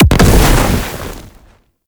Bow Projectile Fire Explosion; Ultimate Abilities; Madurai; Warframe; Focus

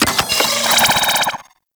Orbital Strike Warning; Warframe; Kela De Thaym

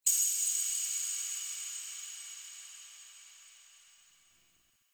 Enter; Warframe; The War Within; Queens Lair; Teshin; Cinematics

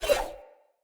Dagger Blade Flip Fill; Weapons; Player; Swords; Fey

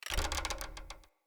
Foley Winch Tension Wobble; Enemy; Nimrod

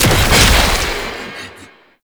Zoo Ape Consume Action; Warframe; NineteenNinetyNine; Stage10TheZoo

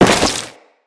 Crewman Step Flesh, Footsteps; Corpus

